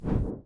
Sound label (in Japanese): 足音; LcdDem SFX